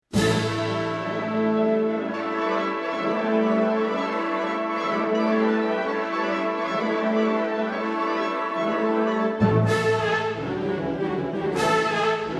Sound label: Scottish Dances